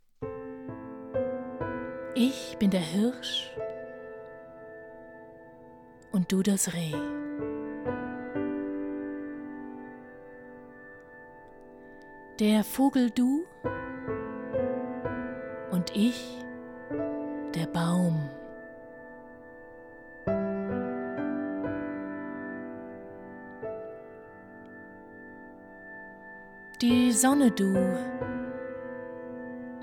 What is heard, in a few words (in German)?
Liebeslied mit Melodie; Rilke